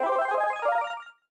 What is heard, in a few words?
Power Up In Room; SM3DL